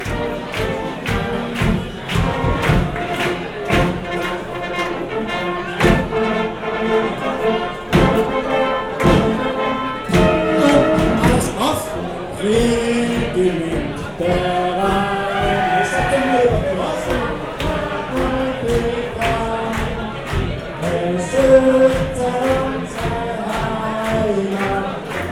Blasmusik; Lexikon-Roman

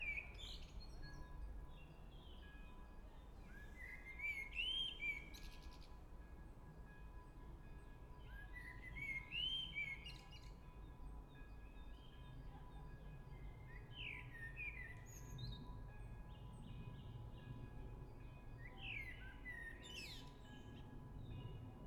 Wald; Lexikon-Roman

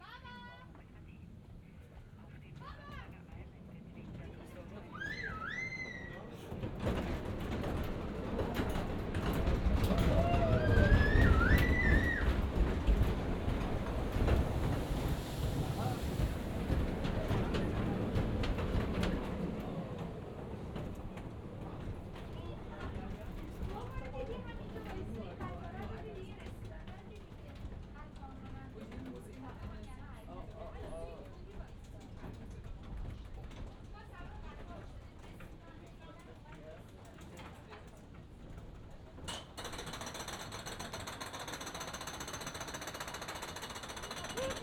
Hochschaubahn; Lexikon-Roman

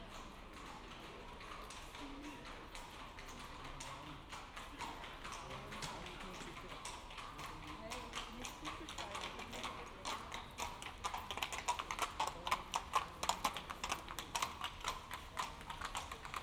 Fiaker; Lexikon-Roman

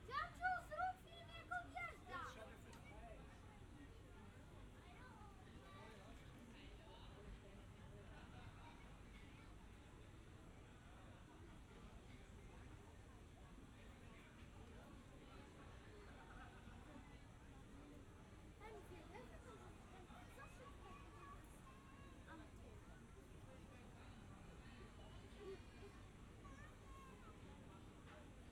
Liliputbahn; Lexikon-Roman

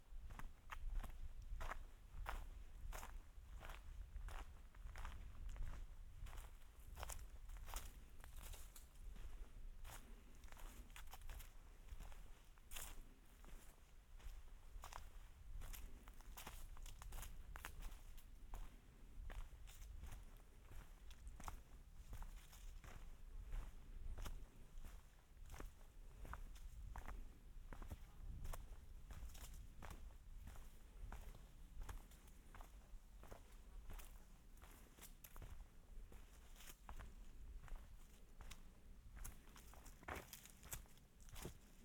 Gehen Wald; Lexikon-Roman

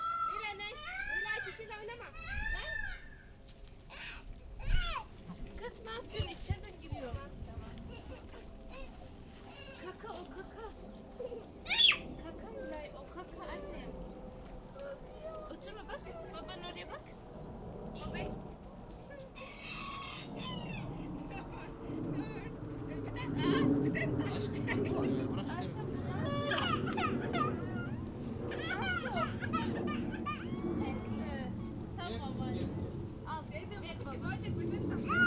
Pfau; Lexikon-Roman

